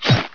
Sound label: STAB, 碰撞声音素材